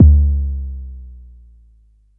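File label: Long bass drum, Jomox Airbase99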